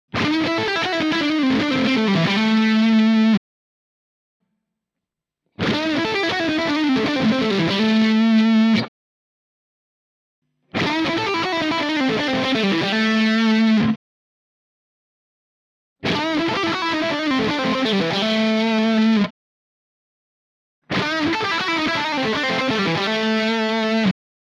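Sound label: Lead SC1 Single coil, pickup; v series v155t 5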